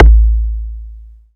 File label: 808s